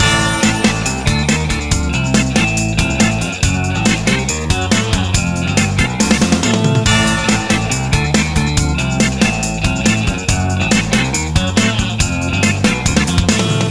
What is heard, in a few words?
halloween rock'n'roll, A rocky and funny retro halloween tune; Liberated Pixel Cup; A rocky and funny retro halloween tune with guitars, drums, organ, bells and theremin!